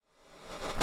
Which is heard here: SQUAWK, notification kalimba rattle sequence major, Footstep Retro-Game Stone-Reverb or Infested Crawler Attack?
Footstep Retro-Game Stone-Reverb